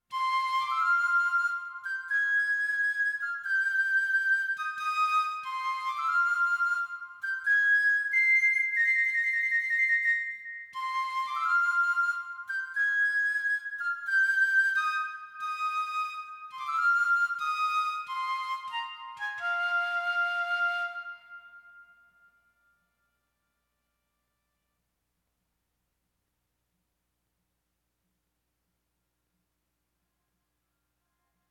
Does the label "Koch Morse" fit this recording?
No